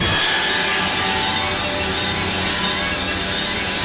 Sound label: G4 ORCHES; Northstar; The Wizard VOL-1; ORCHESPHERE 1